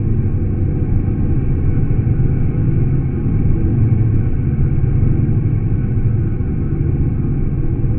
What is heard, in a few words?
Drone Ambient; Drums & Drones